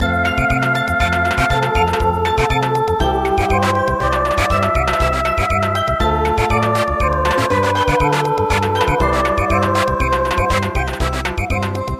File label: Samba, midi